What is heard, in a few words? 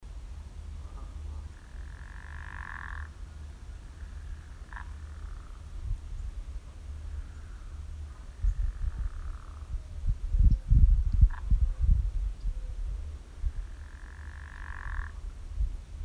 pickerel frog; jim brighton